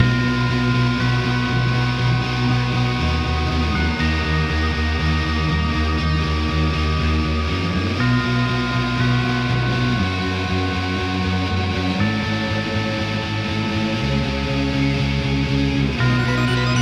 Rock; steep

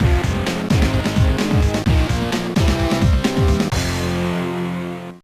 Mission Clear

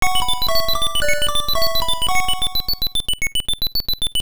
Game Start; ninja kid

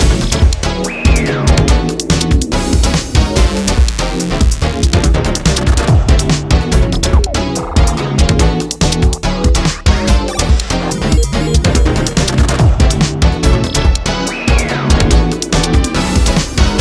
BGM STAGE

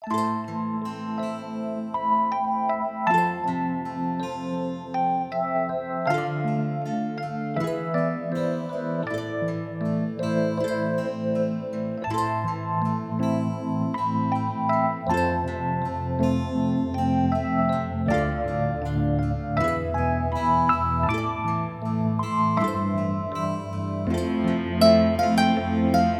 Descending Distortion version; Sora to Umi ga Fureau Kanata; Ocean Side

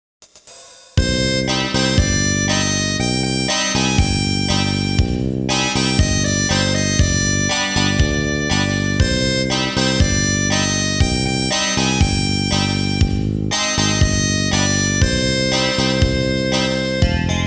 Defeat; zero4 champ doozyj

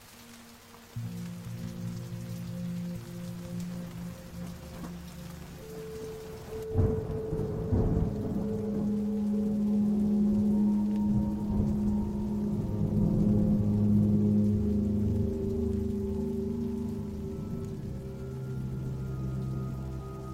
Quarters Music; Tales of Sorrow Strawsbrough Town

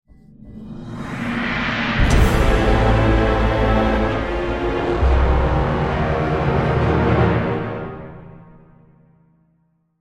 Stinger; Marlow Briggs and the Mask of Death

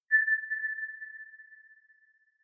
Card Xyz Break

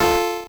level squish F A; earthnight

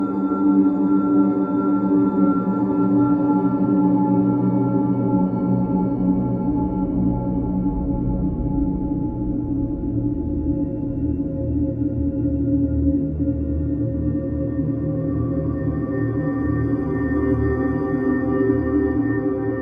Enemy Awareness Music; Void Bastards

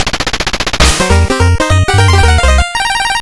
Scam Jingle; curse crackers for whom the belle toils switch windows gamerip 2022

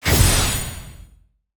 Land Xyz Middle

